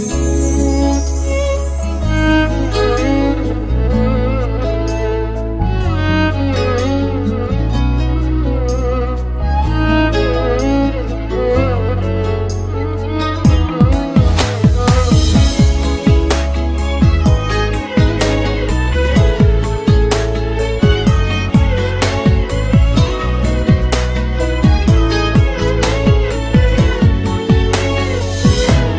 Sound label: Classical